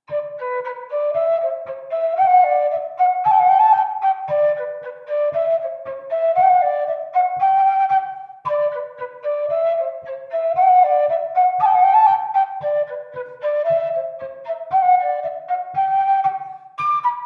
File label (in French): Bourree; Recueil De Raoulx; version d origine